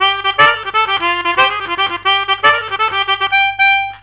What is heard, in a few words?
licks, Melodica